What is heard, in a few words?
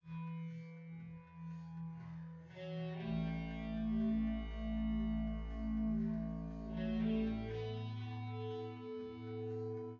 wdrums